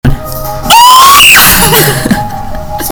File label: real man scream